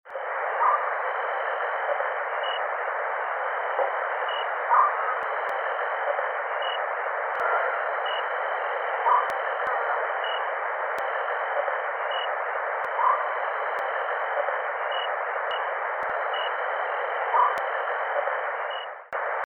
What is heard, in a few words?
African Scops Owl